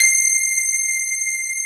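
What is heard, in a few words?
grand piano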